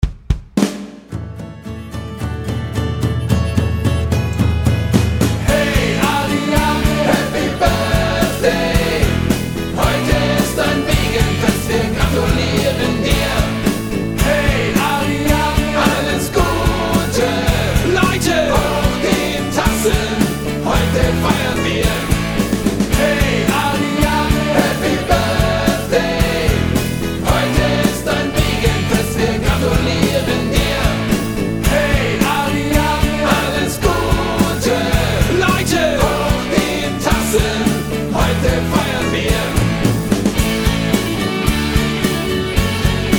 Happy Birthday Ariadne

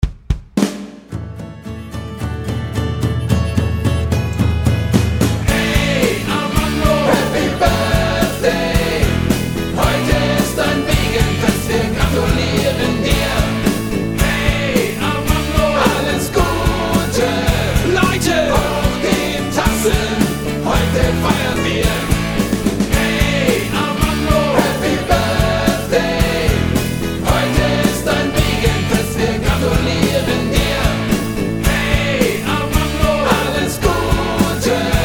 Happy Birthday Armando

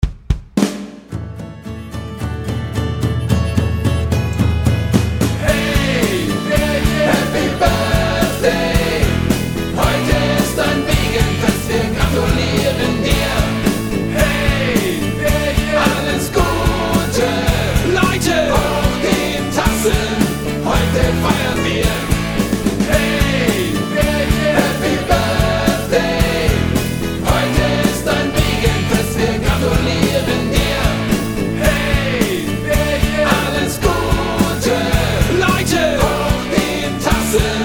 Happy Birthday Baerchen

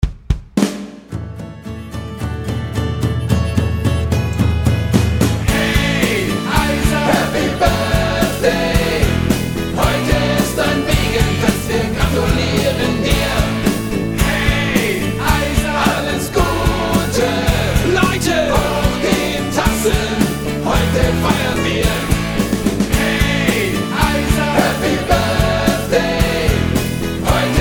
Happy Birthday Ayser

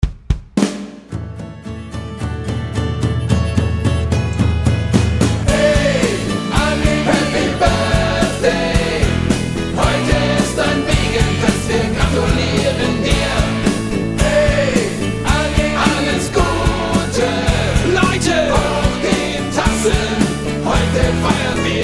Happy Birthday Annemie

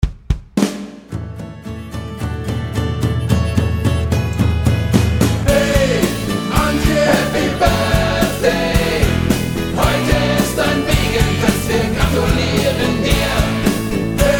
Happy Birthday Antje

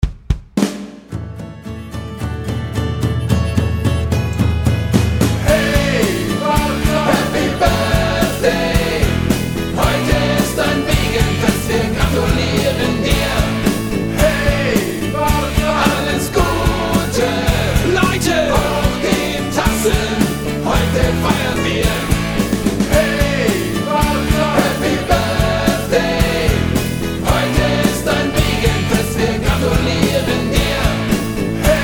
Happy Birthday Barzan

